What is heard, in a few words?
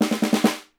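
SNARE HIT; Miroslav Vitous - Percussion & Harp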